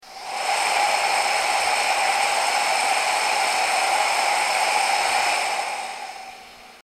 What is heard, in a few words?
EXHAUST AIR SOUND WITH NO AIR AMPLIFICATION